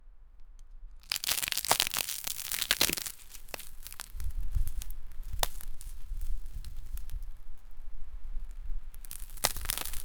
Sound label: the soil cracks and somet; earth